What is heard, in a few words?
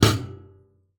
Classical Guitar Extended Technique